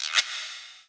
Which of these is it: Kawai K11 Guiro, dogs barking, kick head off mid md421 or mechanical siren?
Kawai K11 Guiro